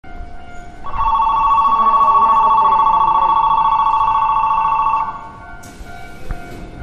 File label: bell; stations melody; kugayama